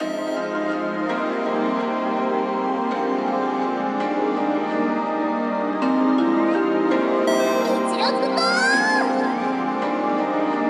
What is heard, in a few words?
Cm BPM165